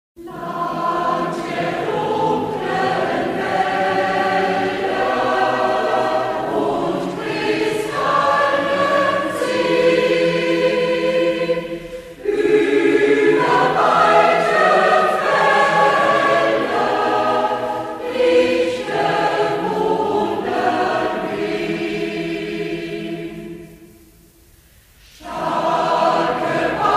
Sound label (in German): Land der dunklen Waelder Chor; ostpreussen